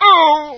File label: pain; Rosenberg; Source engine